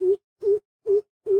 Amb Env Bird-Hoot

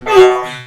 Male Hurt Robot; Mars Sortie

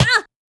Summoner Damaged

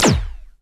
Small Multi Beam; battle suit aces

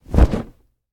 Animals Monster Wing Flap Single Left

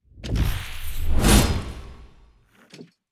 Battojutsu; Marie

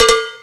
Sfx Winter games

